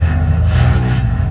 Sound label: gtr; hellcafe